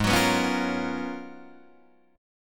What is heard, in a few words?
Ab Minor 13th, Ab, Guitar, Drop D